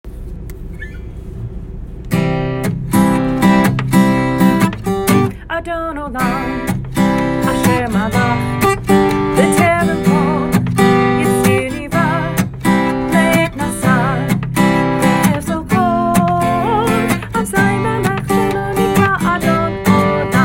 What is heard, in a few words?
Adon Olam Folk